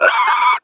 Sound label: pig